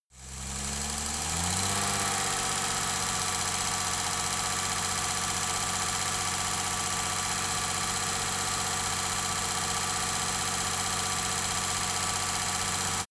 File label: car jaguar e-type constant engine